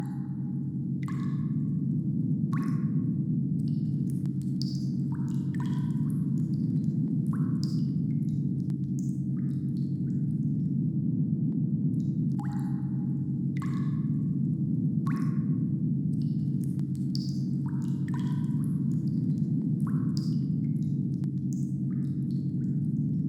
Bathroom Ambiance; I Spy Spooky Mansion Deluxe

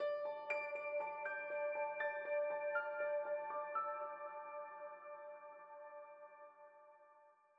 Piano

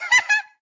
Haha; talking tom cat 2 android ios online gamerip 2011

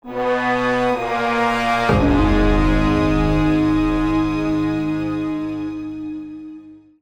Game Over Jingle; Avatar The Last Airbender The Burning Earth; ps2 wii xbox 360

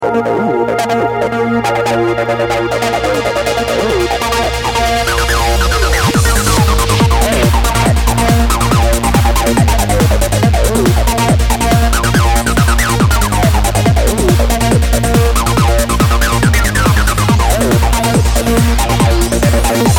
Score Music; Assault Squadron; iOS gamerip 2010

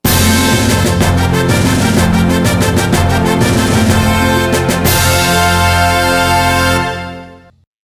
Jingle ~ King of Baseball; mario super sluggers; wii

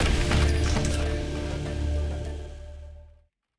Retry Jingle; Classified; Windows

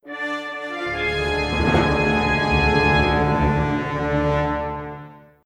Music Death Stinger; Zak Storm Super Pirate; mobile

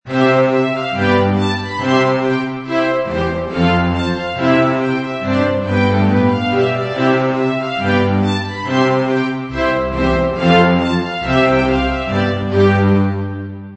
Gramophone Classical Music; crazy machines 2 gamerip

